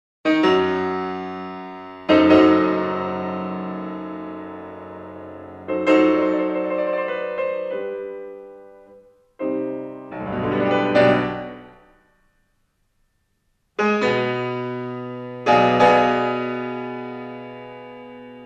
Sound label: Piano Sonata No. 32 in C minor; Wilhelm Kempff; Beethoven; Piano Sonatas